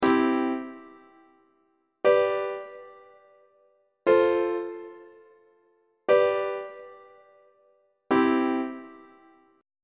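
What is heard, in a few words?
c major root position imperfect authentic cadence on treble clef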